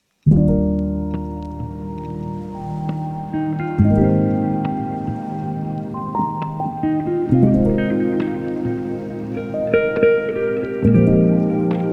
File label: lofi hip hop